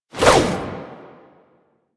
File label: Jump Whoosh; Enemies; Foley; Generic Foley; Warframe; Duviri; Dax